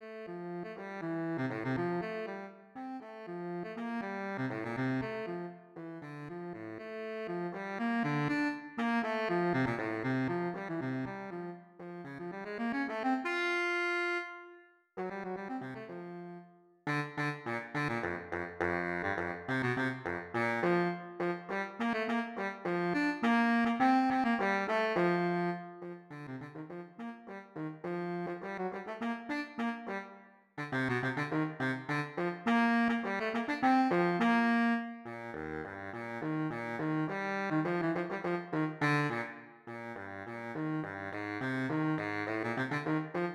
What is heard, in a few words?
baritone saxophone